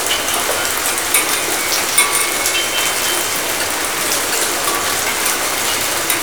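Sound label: metal rain verb medium loop, rainscapes; ambient, ambience; Left 4 Dead 2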